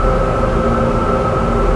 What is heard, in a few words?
Amb Scifi Spaceship Loop; SFX Kit; Sci-Fi Loops